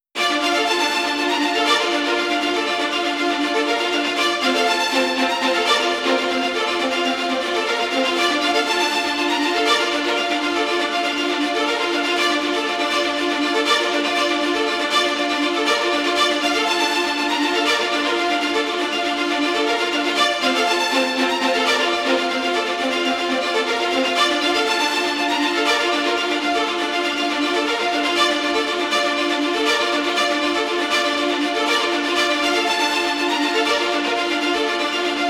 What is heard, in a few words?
tension strings comp; gerilim; By Burak Sound Bank; tension vol 22